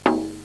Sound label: drum, percussion instruments; Sound Effects for Multimedia - Volume 1